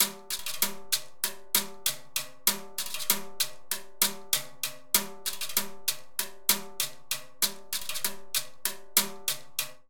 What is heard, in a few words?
Bolero Maracas Timbales 86bpm; Latin Percussion; Ableton; Factory Packs